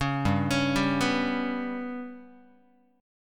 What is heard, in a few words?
G Major Flat 5th G down Guitar; GMb5 arpeggiated; JGuitar